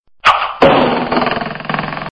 arrow

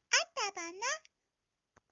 Squeeky

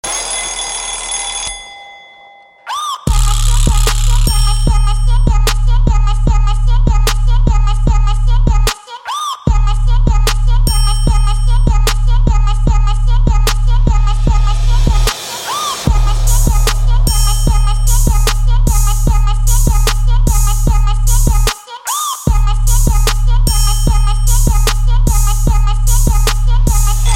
trap

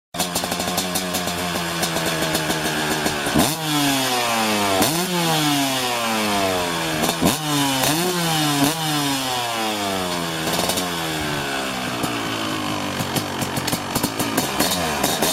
Sound Honda Cr250

